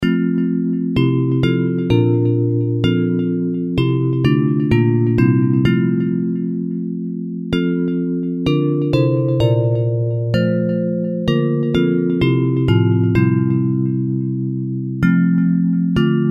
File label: Bells; Hymns